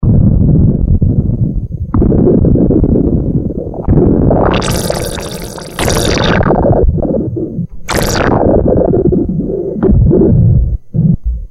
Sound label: liquid thunder